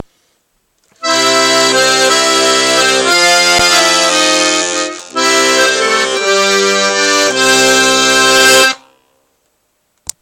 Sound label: intro on my new accordian